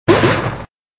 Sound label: Box Destroyed